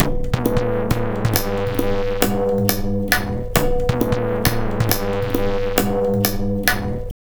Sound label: Tabla, 135 BPM; Zero-G; Pure Tabla